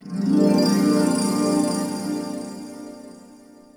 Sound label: Magic Harp; Age of Wonders